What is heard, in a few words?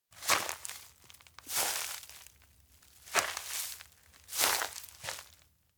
steps outdoor wheat field walk; anemoi